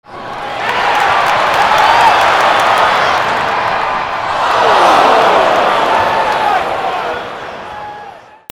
CROWDS

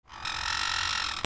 BIRDS

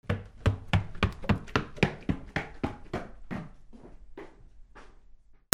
FOOTFALL

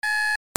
BUZZERS

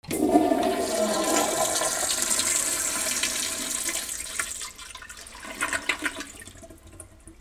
BATHROOM

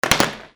FALLING

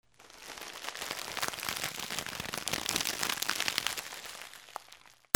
FRICTION

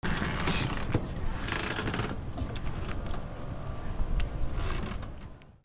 SQUEAKS

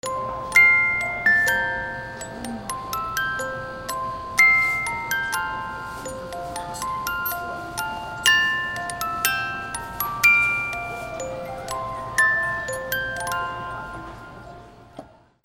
MUSICAL

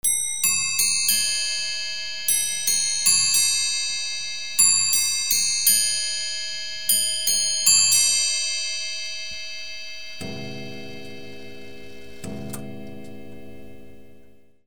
BELLS

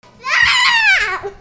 PEOPLE

